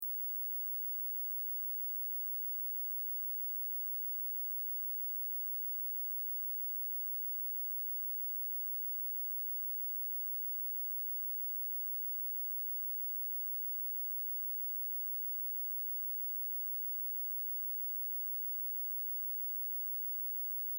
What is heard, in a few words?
Test Tone Square; 16Khz 60 Secs -18Db Loop; Technology; Blastwave